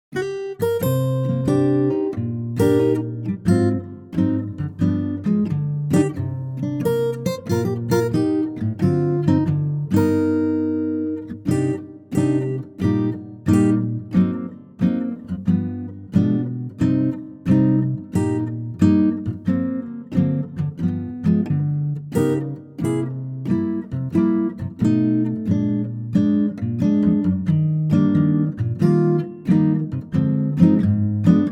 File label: Cm acoustic guitar